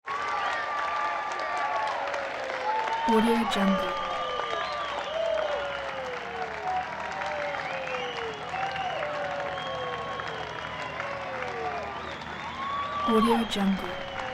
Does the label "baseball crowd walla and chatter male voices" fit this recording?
Yes